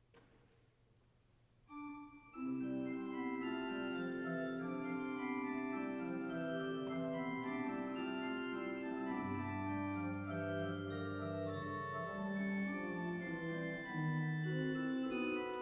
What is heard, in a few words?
C Major Trio